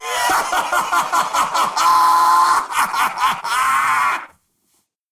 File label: ahhhhhhhahaha; tf; slender; snatcher